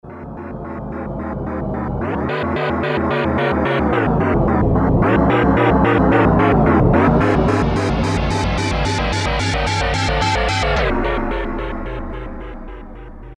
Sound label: Deep, Roland U-220; Factory Timbres